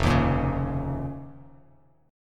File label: F 7th Suspended 4th Sharp 5th F down Bass Standard; F7sus4#5; strummed; JGuitar